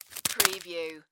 Cracker Single Package

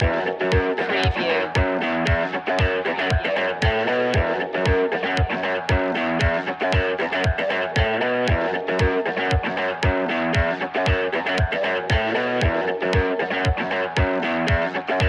boots of fire loop

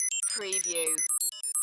Data Musical Readout; sound-effects-bible

